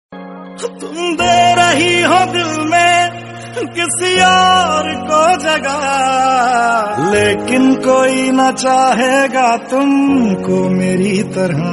Sad Hindi Ringtone

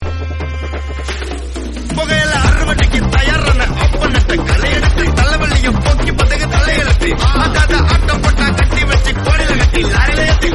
Rap Song Tamil; Leo

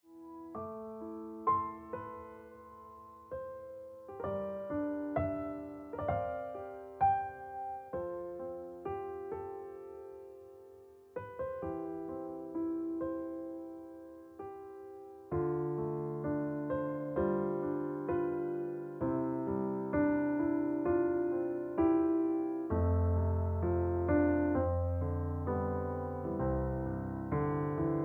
Piano Music

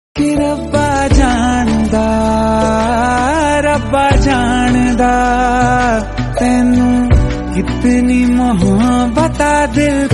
Hindi Song; Mission Majnu

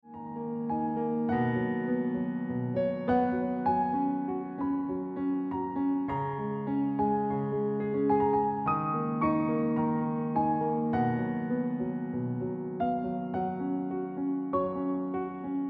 Ringtone Piano Music

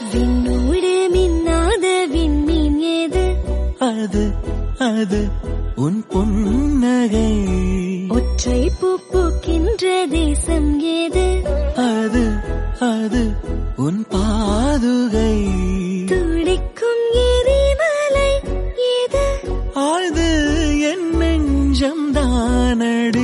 Tamil Song

